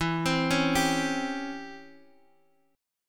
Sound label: Db Minor Major 7th Flat 5th E